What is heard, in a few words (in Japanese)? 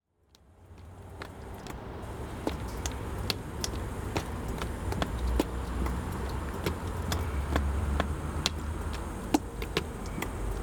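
yukidoke mizu no ochiru oto, sound of falling snowmelt water; 雪どけ水の落ちる音; Chino Cultural Complex; 茅野市民館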